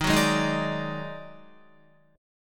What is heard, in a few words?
Eb Minor Major 7th Double Flat 5th, Guitar; JGuitar